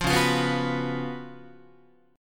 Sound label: C sharp 11th Guitar, C#11 chord; strummed; JGuitar